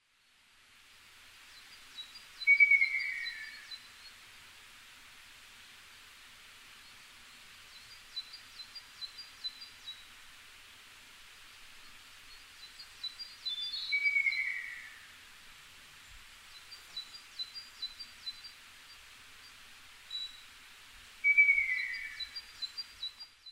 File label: Halcyon coromanda; アカショウビン; 日光市土呂部 alt=930m; Rec: SONY PCM-D50; Mic: Panasonic WM-61A; 移動しながらさえずり、森の奥に姿を消していった。